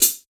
focused hi-hat sample a sharp key

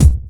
clear steel kick drum c sharp key; kick drum samples

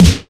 damped snare e key, Loudest frequency: 997Hz; snare drum samples; drum single hits one shots

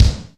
smooth kick sample b key; Duration 0:01

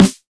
short acoustic snare sound g key; snare drum samples

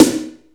dope snare drum sample d sharp key; snare drum samples

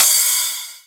urban crash d sharp key; Cymbal drum one shot; drum sample; single hit

